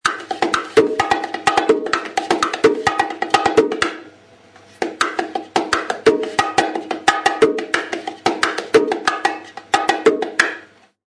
MARTILLO STYLE, bongo

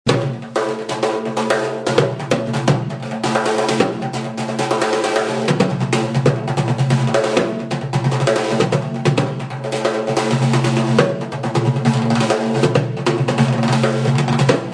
bendir short; Bendir short solo

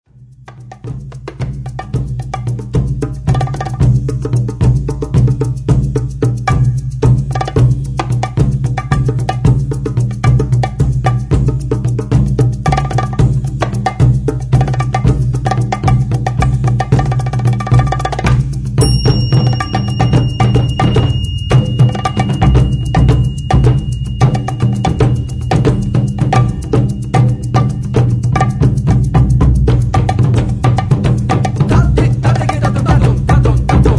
korvai

